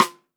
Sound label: Xstick; Roland SPD-S